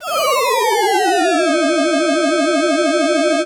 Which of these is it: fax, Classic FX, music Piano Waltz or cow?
Classic FX